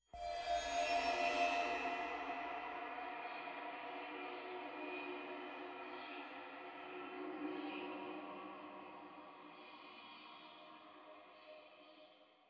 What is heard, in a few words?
Glitch